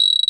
guiro, synth guiros; Zero-G Ambient 1

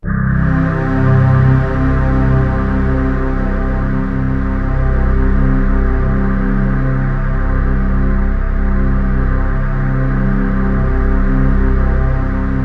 STRINGED; MORPHEUS

